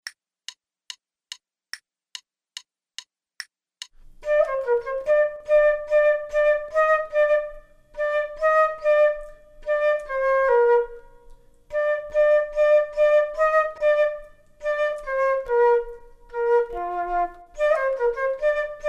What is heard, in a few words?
Duets flute; Selected Duets for Flute Podcast